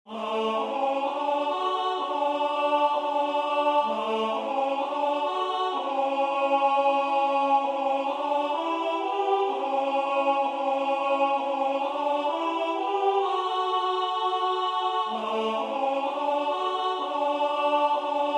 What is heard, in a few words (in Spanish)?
CANTO 2 voces BAJO; ADVIENTO